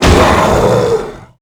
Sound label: golem hurt